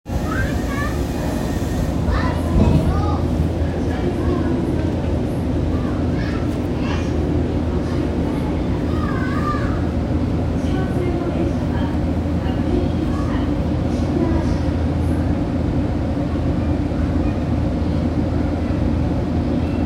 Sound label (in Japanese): 東西線葛西東